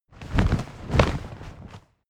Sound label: flag large flap